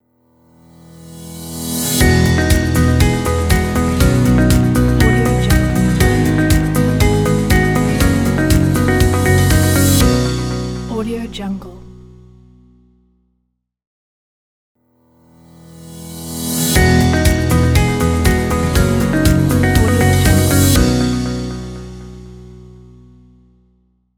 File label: a corporate logo